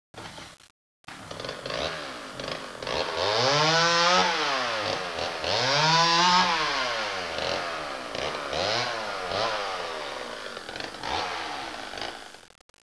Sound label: chainsaw; freeciv